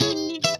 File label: FUNK N CHUNK, FUNK GUITAR; Greytsounds